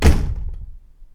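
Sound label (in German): Haustür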